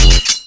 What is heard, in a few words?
clash, sword; Dystopia; weapons